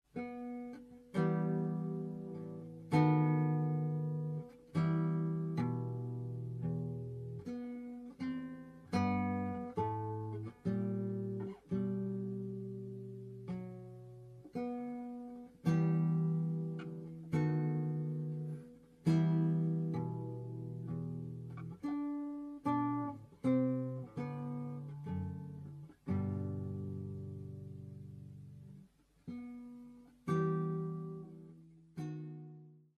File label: Larghetto; Carulli